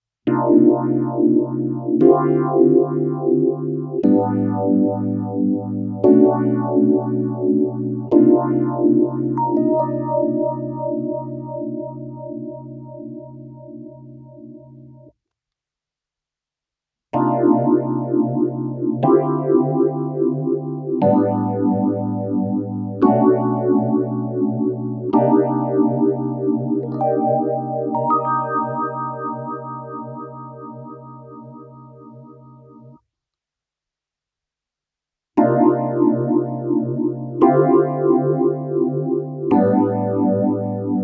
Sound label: phaser